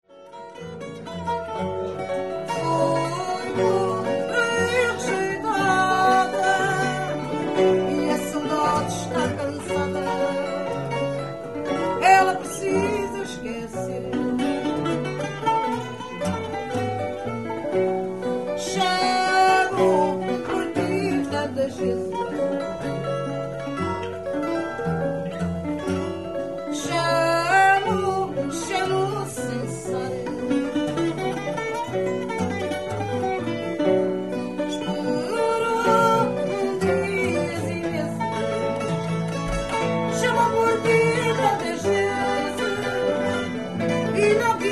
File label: fado tango, lisboa; portugal